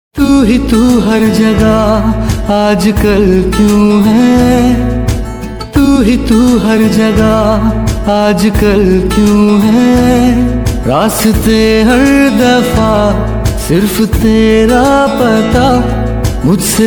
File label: male hindi ringtone